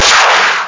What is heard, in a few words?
ROCK; High Octane; game sounds